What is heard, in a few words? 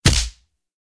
hit; Zombies Layer Viking